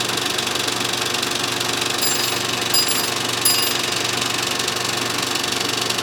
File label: bells; projector